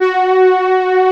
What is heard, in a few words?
MATRIX 12, PADS; Masterbits; Vintage Voltage